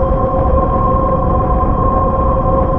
warp engine

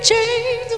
chains

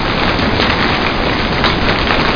Rain; Authorware Professional for Mac

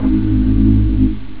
synth; da capo vol1; instruments

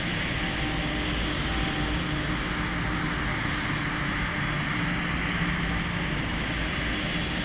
JET LP, Airport; zones

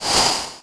font shoosh; Earth and Beyond; EA Games

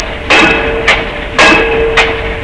pile driver; Sound Effects for Multimedia - Volume 1

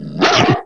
DG LUNGE; REDNECK; RAMPAGER

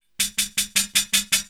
HIHAT, Drum Bass, 160; eJay

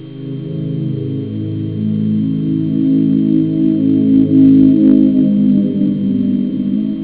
dream, synth

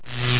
BOLT; landslor

